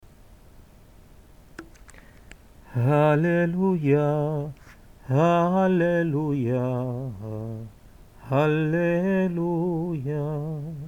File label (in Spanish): Aleluya Cantado en Ar